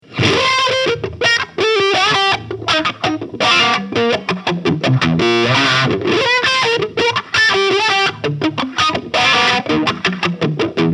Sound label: Morley MTLW2 Lead Wah Boost Crunch